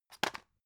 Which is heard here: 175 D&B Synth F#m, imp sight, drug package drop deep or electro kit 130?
drug package drop deep